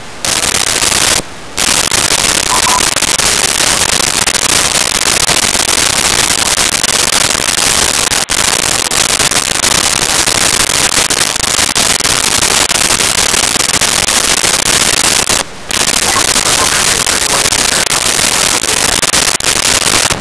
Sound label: Police Radio; Vocals & Wallas; SONY PICTURES SOUND EFFECTS SERIES